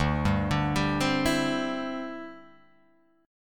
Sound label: D 7th Suspended 2nd Suspended 4th D